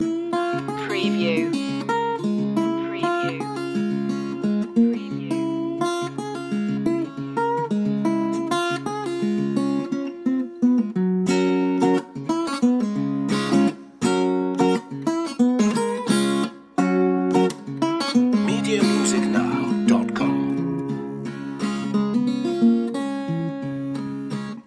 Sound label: blues loop